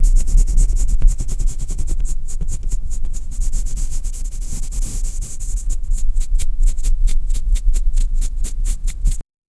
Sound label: scratching noise